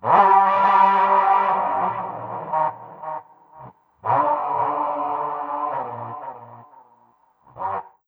Walrus, Leads And Riffs; Sonic Foundry (Sony Creative Software); Synchro Funk

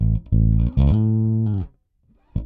Up Slide Riff, Bass; Bass X

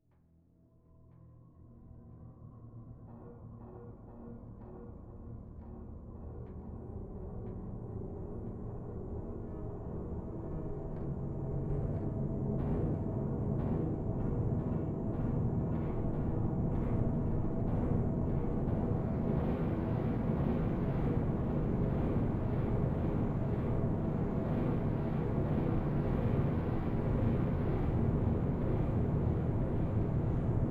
metallic atmosphere; maybe; ambient or nature

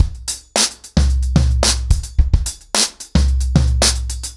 110BPM, acoustic drums, drumloops

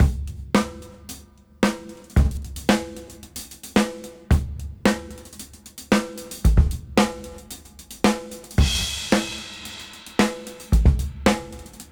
Fun 112 BPM; AMG; Keith LeBlanc Phat Butt Jams

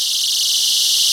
SPACE EFX MKS; Rubber Chicken Software Sample Bank

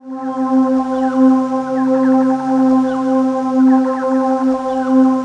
ATM TONAL; Best Service - XXL Production Effects

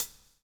COPS STK; Spectrasonics - Backbeat

